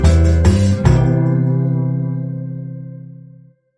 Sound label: Dreamy Bossa, Hit